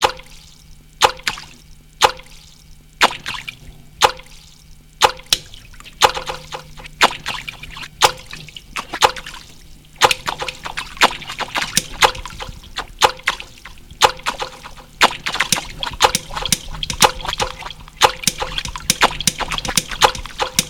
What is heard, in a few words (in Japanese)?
叩く音楽